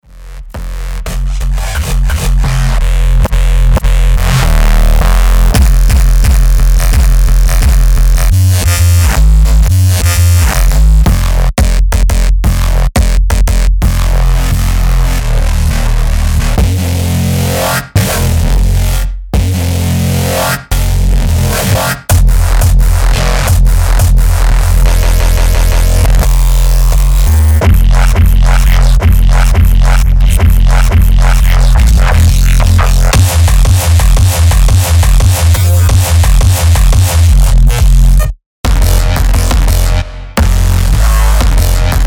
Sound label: bass